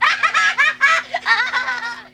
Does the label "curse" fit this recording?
No